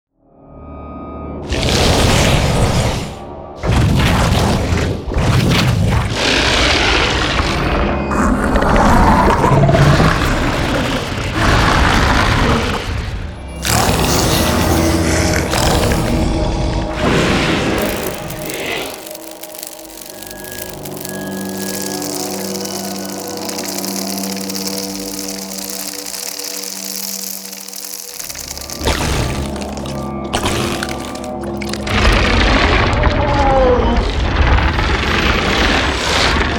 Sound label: creatures sound fx; sfxtools